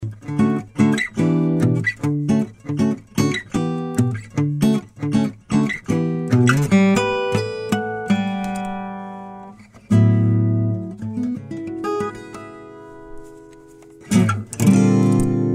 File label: Sample, guitars